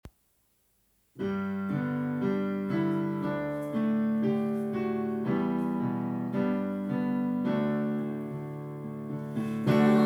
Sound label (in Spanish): piano